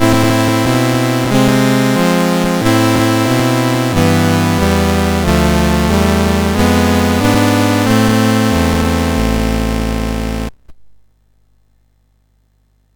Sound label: Project Firestart, MOS6581R4